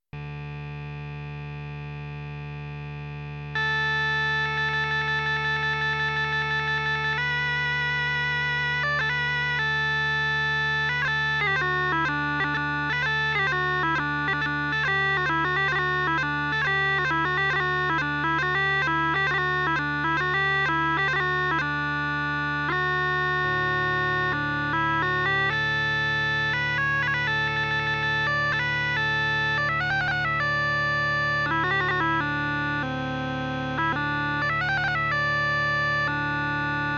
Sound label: french 20 inch, pipes